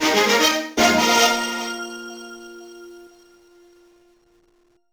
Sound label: Retro Jingle; Next Up Hero; PS4